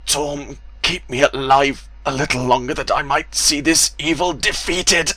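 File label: female dying; NWNDS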